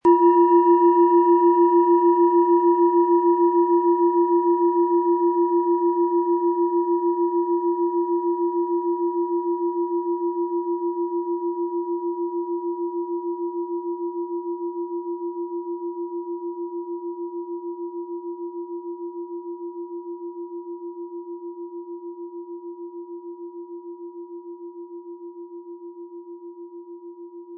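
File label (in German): Planetenschale inkl. Klöppel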